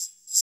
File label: EQ Perc, Percussion Mix